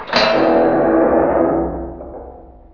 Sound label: DOOR; Hidden & Dangerous; h&d demo